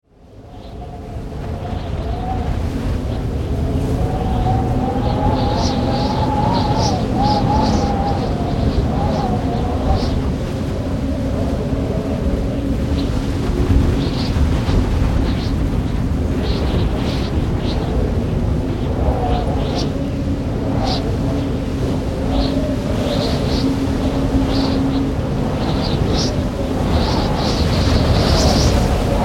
Storm Wind Exterior - Gale raging with lots of wind element levels; Weather